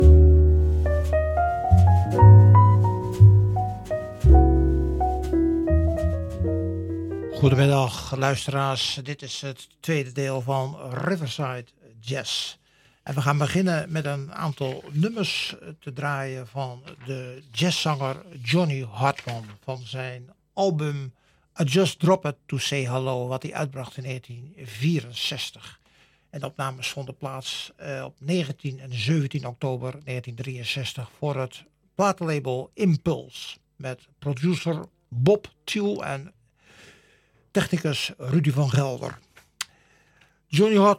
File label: Jazz; riverside jazz